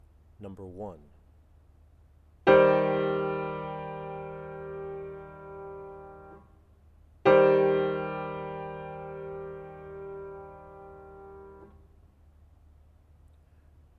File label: chords; ear training